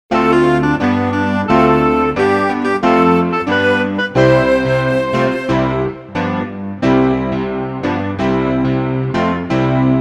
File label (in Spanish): Pista instrumental sin melodia